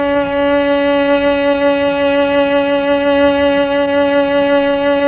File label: STR, Melo. Cello, Tron Cello; Roland LCDP09 Keys of the 60s and 70s 1